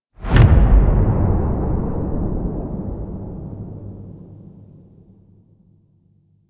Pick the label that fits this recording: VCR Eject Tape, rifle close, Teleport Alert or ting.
Teleport Alert